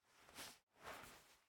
Click Click; raw game audio files; Mita; MiSide

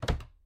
Close; MiSide

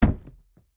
Head Hit Wood; game audio; Mila; MiSide

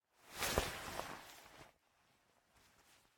Player Ladder Window; MiSide

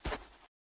Step Ground; MiSide

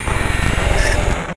Teleport Glitch; MiSide

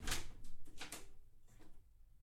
Door Open; Samo; MiSide

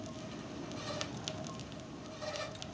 Cage Door Move; Game rip; MiSide

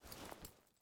Cloth Stop; Game rip; MiSide

